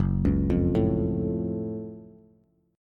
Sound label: Gb Minor Major 7th Gb Bass; arpeggiated; JGuitar